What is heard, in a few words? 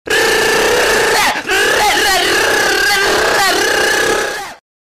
machine gun sound; tyler1